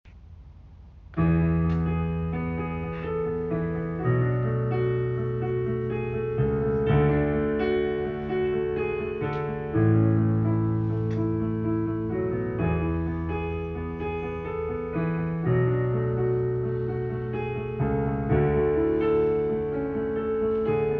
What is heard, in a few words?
Tenor